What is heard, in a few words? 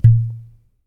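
bottle open, kitchen; 1000fx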